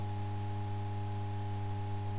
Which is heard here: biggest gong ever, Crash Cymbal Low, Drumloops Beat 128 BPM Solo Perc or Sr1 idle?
Sr1 idle